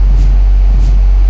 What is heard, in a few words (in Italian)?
Veicolo, motori